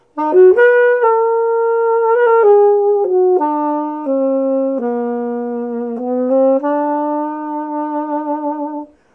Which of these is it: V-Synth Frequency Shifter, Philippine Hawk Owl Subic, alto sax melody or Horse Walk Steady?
alto sax melody